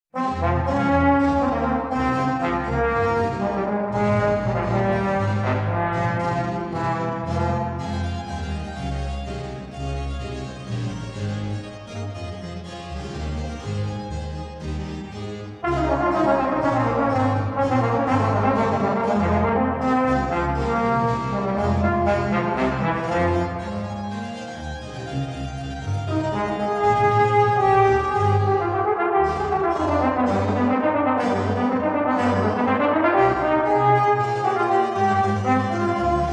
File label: Concertino in f-Moll Allegro